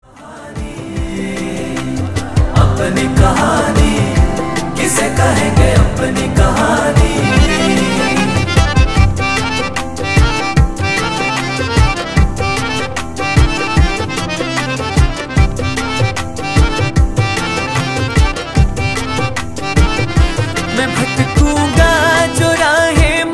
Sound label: Shyam Bhajan